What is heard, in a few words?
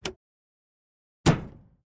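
Model T Door Open Close, ford model t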